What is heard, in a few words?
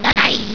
jump; alienarena; grey